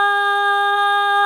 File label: Skrik Scream Loop; Viewfinder